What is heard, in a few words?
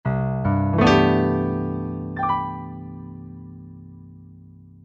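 Acoustic Piano; V-Synth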